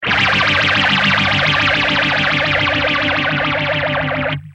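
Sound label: guitar, Mutron phasor II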